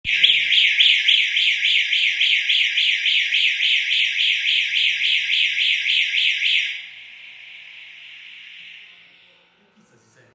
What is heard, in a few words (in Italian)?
Pre Allarme; sicurezza